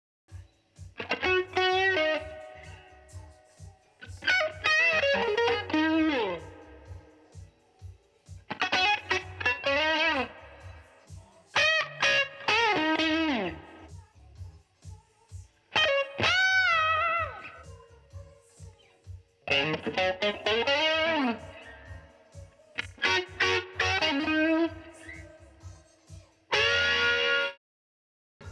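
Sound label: guitar filler 64 beats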